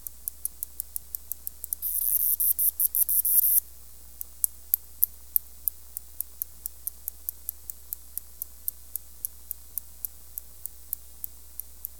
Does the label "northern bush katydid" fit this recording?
Yes